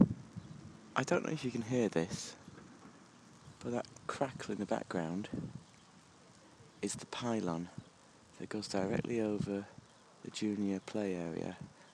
crackle of the pylon

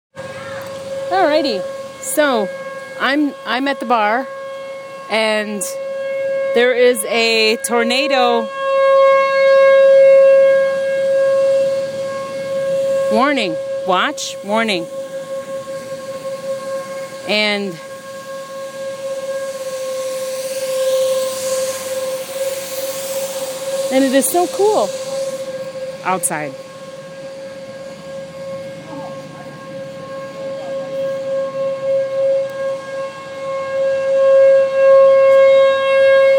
tornado sirens

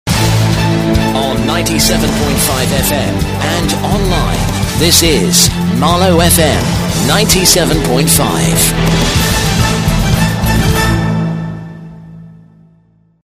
news jingle; marlow fm 2011

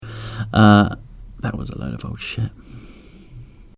timp

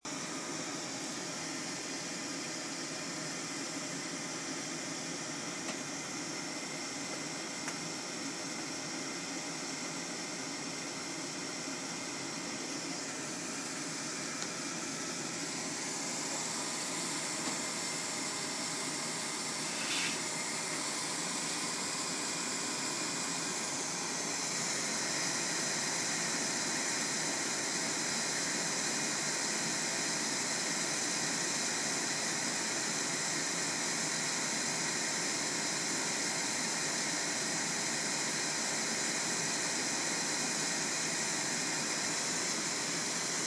white noise vacuum cleaner

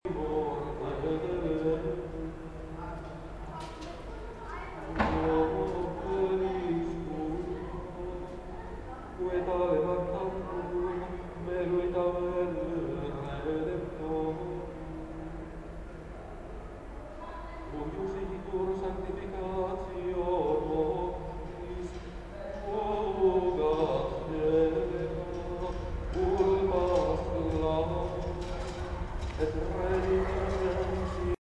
piped chant in leake street tunnel at waterloo

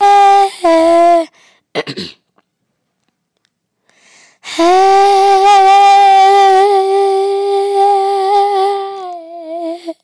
opera

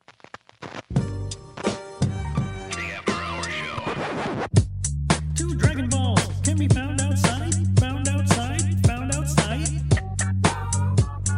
rap; Kermit d frog

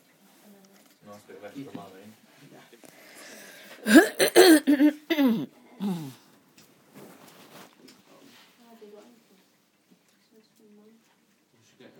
soundscape; visiting my parents

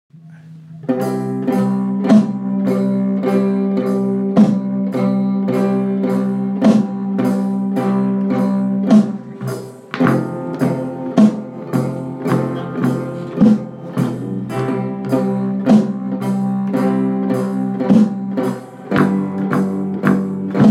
22 bar blues

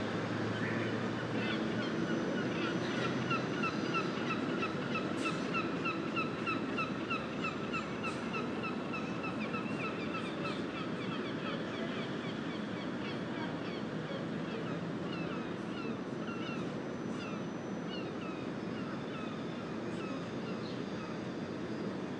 seagulls outside work this morning

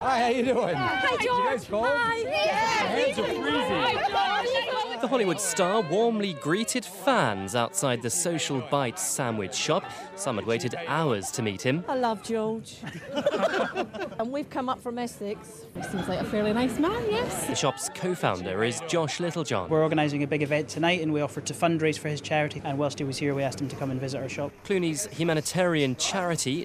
crowds cheer; clooney at edinburgh sandwich shop

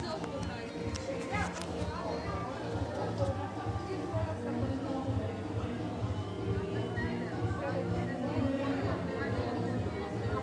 party at next door synagogue

